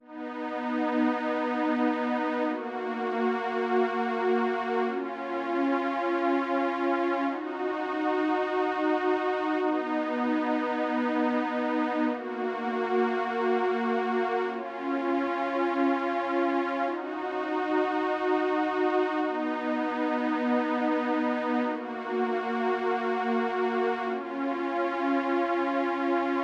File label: 100 BPM D# Min Chords, Pop; Melodies; Cymatics; GHOST (Beta Pack); Broken Heart